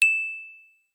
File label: Twinkle, phonetones notifications; LG; Optimus LTE3